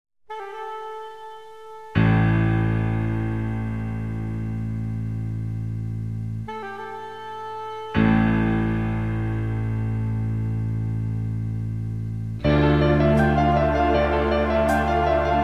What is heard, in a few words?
brass minus